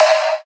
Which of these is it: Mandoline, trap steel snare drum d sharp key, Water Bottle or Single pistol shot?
trap steel snare drum d sharp key